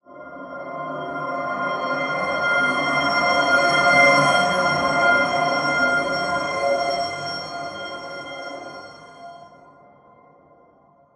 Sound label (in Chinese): Drone, 重型降落，撞击和刮擦; 无人机，重型降落，撞击和刮擦，foley fx; 巨大的爆炸